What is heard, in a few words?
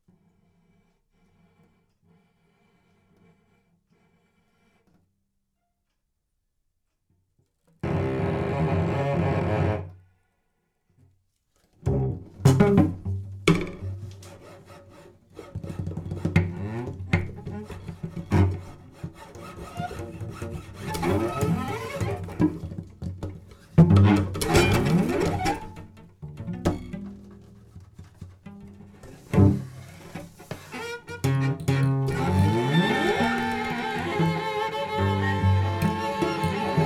cello